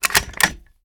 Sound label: reload empty bolt close; reloads; gmod